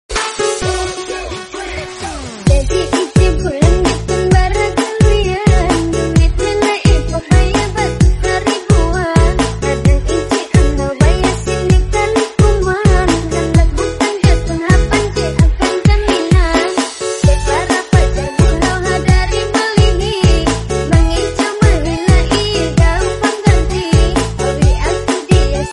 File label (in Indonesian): Alarm Korea Lucu Ringtone